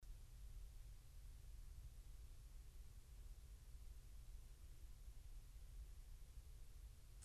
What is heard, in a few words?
Q2U Self Noise